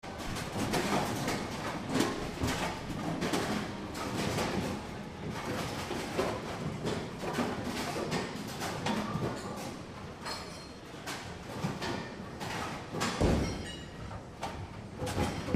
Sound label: rumble and roll